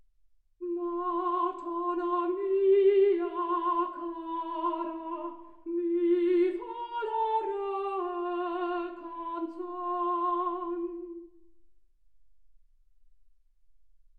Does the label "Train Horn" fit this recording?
No